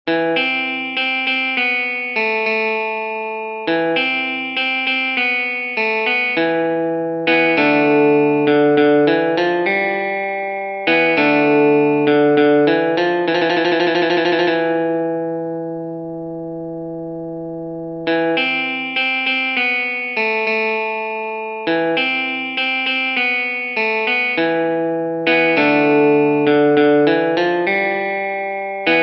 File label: movie instrumental music